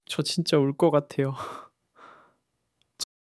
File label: crying